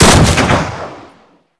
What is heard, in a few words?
Sniper Rifle Shot; Warframe; Weapons; Tenno